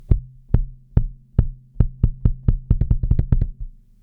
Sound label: kick; Rock basic